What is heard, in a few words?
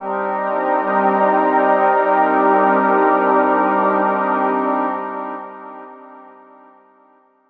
C2, Synth Hits; Zero-G; Soundclash Nu Dance Electro; Acidized